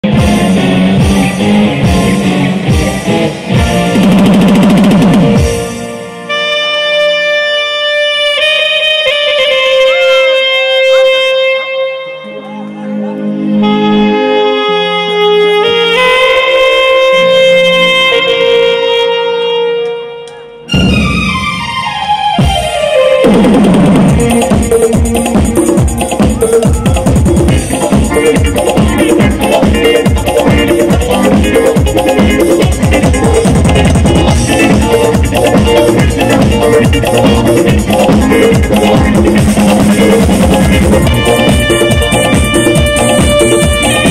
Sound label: saxophone